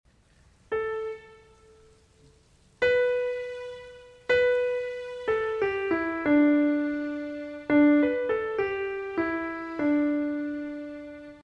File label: refrain melody; Gather Hymnal